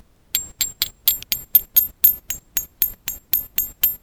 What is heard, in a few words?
Jingling